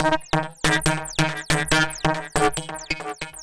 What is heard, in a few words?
TECHNO FX 140